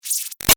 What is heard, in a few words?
Shot Laser Very Light; Sound Design; Lasers; Big Room Sound